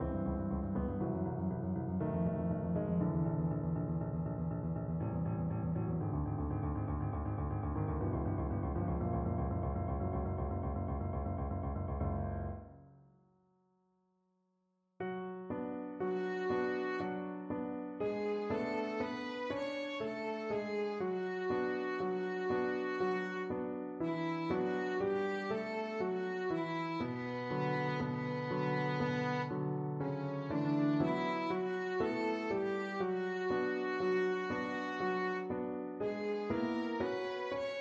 tu che di violin

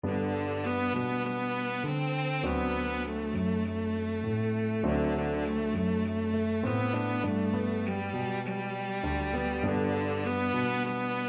hush little baby, cello

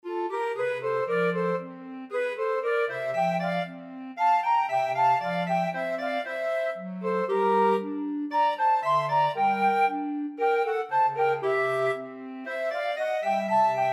flute clarinet bass clarinet

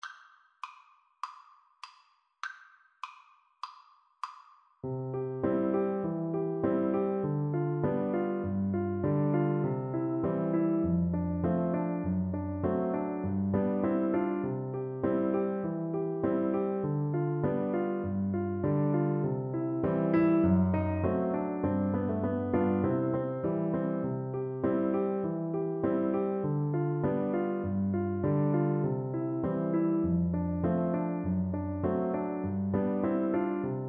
andante, Oboe

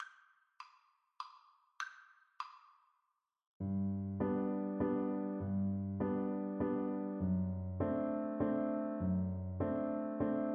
German dance violin

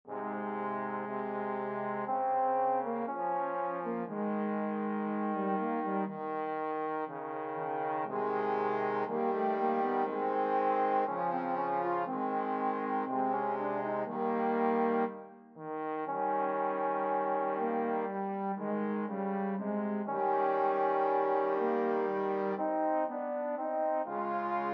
romance, trombone trio

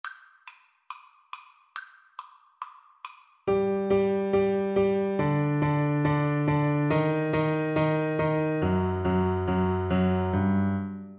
Bassoon; sym25

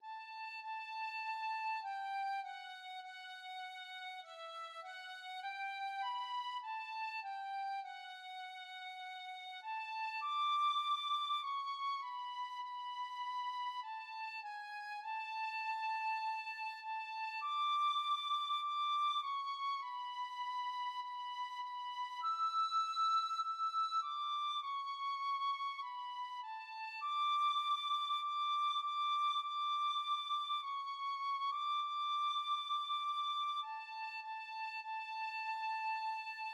it is well with my soul, tin whistle

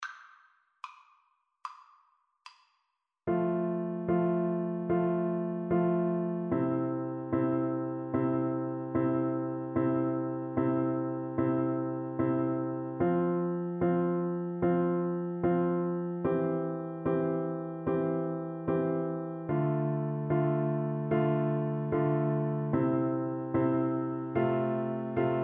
winter, Bassoon

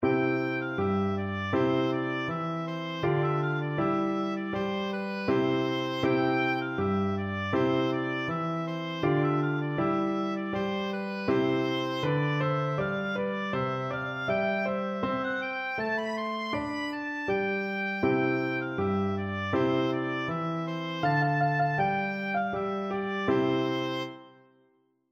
deck the halls, oboe

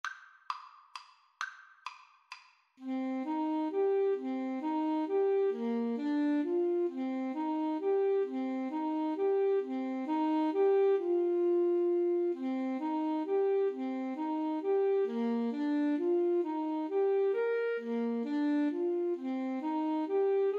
clarinet saxophone duet

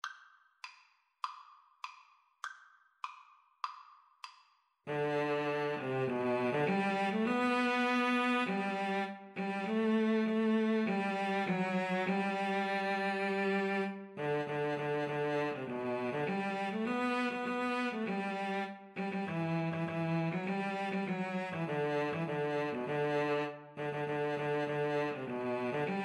Cello Duet

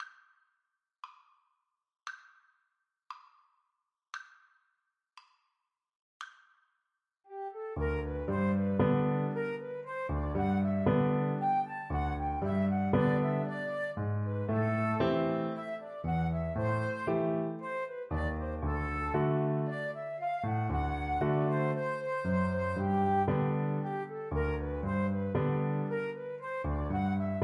Flute duet

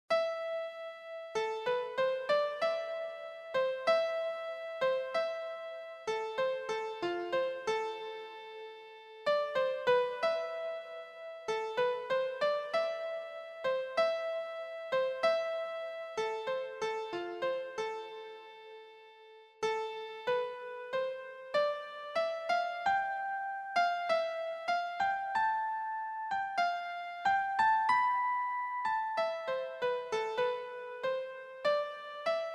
scene finale keyboard

